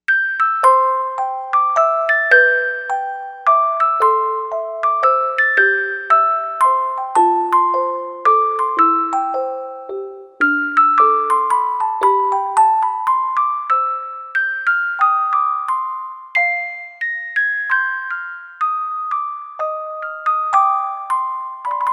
Music Box, Korg M1Rex; Factory Patches

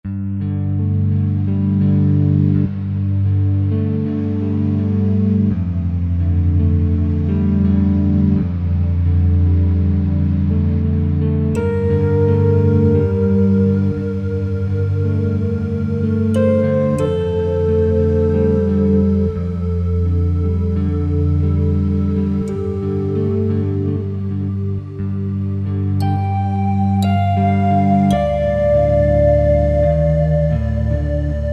Perc Body Pad

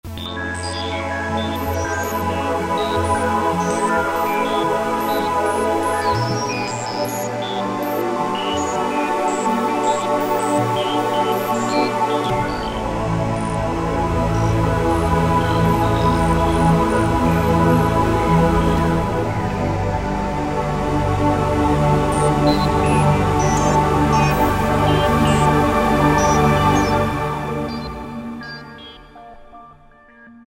Fx Eternal, Yamaha EX5R; Factory presets

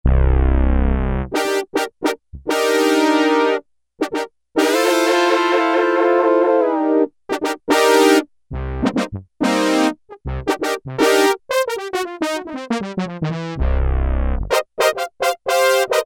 Synth Brass 1, Roland W-30; Factory Performance Patches

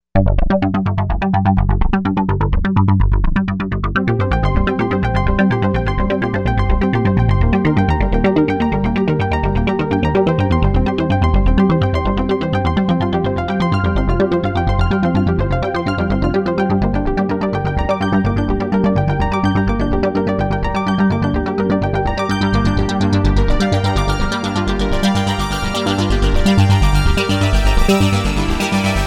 Apick Arp

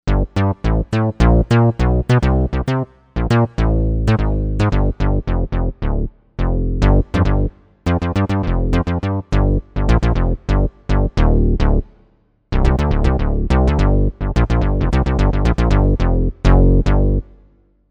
Synth bass, Korg M1Rex; Factory Patches

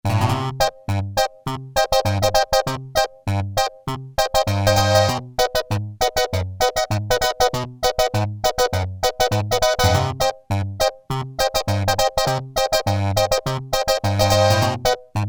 Slash Reed, Casio MT-600; Tones

